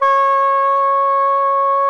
tarogato C#4; SoundBytes - Early Patches

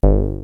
pwm bass, Yamaha CS-5

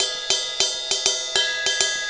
Ride Cymbal Pattern

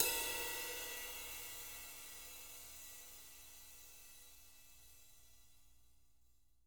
rides; JZ RIDES; Living Drums; Sampleheads; Peter Erskine

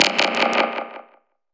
Tauk, One Shots Hit; Sonic Foundry (Sony Creative Software) - Future Retro Dance Excursions

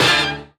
Horn Hit; Sonic Foundry (Sony Creative Software); Knutrix Drum & Bass

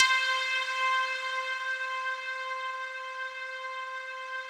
RESO METAL 2 C5, Yamaha VP-1; Music Store

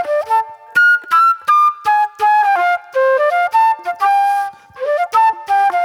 Classic Soul Flute

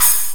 Pause Music; Madden NFL '96

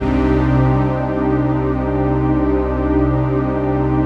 PAD, Dance Mega Synth

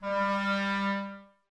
WND CLR, Clarinets; Miroslav Vitous - Woodwind Brass Ensemble; 90's Sample CDs

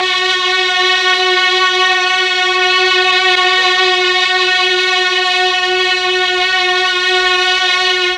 F#2 16 VIOLI, Mellotron; TRON 16VLNS; Mike Pinder - Mellotron Archive CD

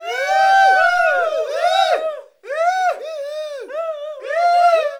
cheer; AKAI S-Series CD-ROM Sound Library VOL-6; SMLL CHEER

